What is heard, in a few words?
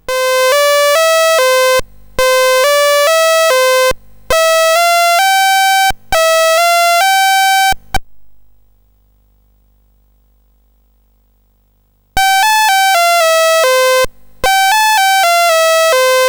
Saints to Sinners BASIC, CSG8580R5